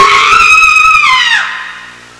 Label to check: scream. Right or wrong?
Right